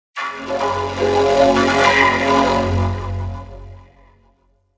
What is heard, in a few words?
Windows XP Startup Sound in Electric, Vocoder